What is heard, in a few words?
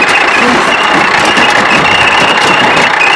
Vehicle Engines; Red Orchestra Ostfront 41-45